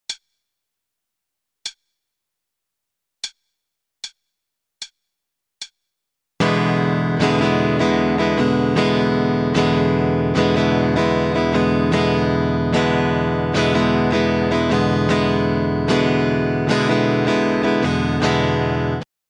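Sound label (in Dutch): Ritme, gitaarstarter; Meespeel CD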